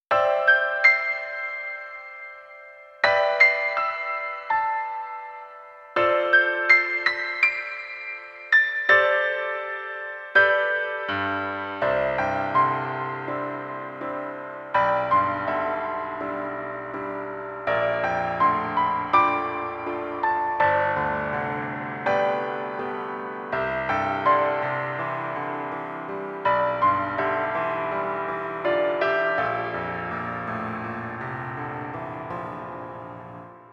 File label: piano; ever green